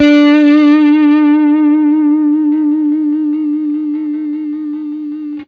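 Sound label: blues long vib, Guitar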